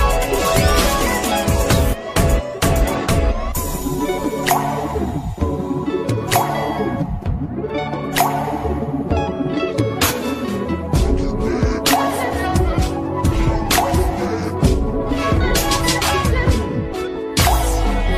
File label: love chorus Inst